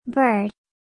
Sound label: BIRD